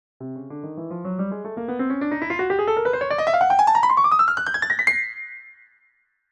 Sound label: Piano chromatic scale